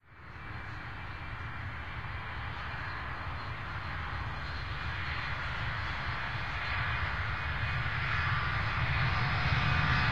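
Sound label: airport rough crash sequence; Left 4 Dead 2; Source Engine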